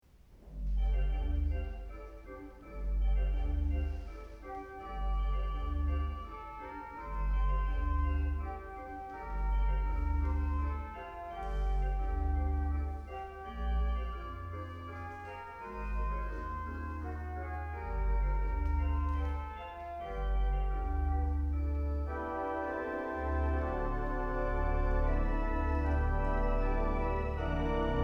Noel varie Quasi Carillon Allegretto Scherzando, organ; Chartres